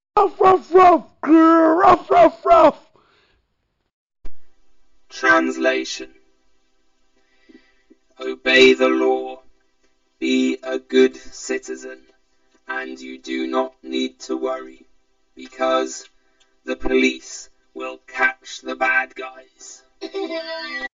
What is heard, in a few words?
Dog Man; health safety in a cartoon